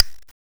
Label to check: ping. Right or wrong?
Right